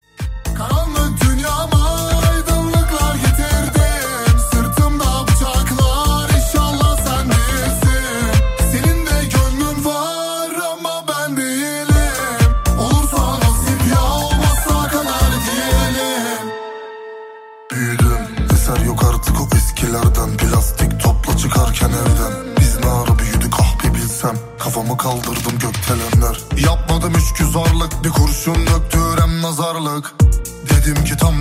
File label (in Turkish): Rap HipHop Zil Sesleri